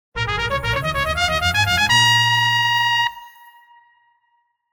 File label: Musical Chimes Trumpet Victory